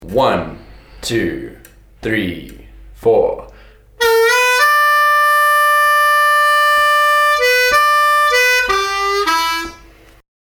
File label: Lick